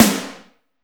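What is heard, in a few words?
CRUNCHY snare, drums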